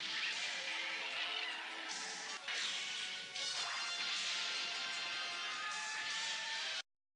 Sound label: horn